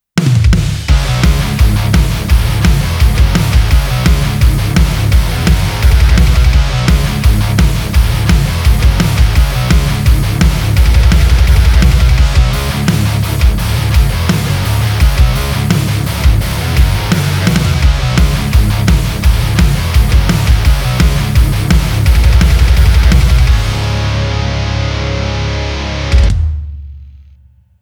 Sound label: HEAVY SAMPLE TRACK